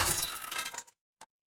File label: impact crash